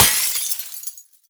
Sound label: break glass jar; physics; breaking